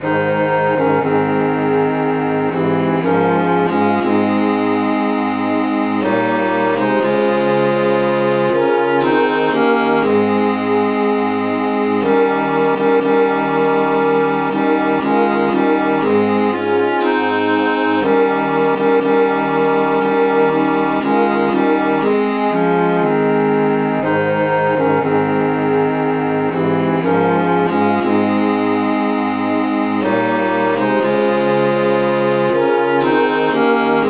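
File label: traditional key D flat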